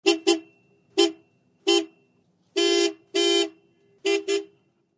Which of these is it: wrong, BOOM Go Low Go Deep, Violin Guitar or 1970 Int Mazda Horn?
1970 Int Mazda Horn